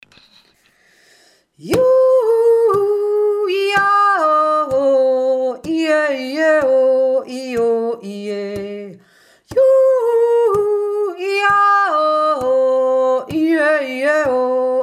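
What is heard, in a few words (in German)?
ju du du pygmäen jodler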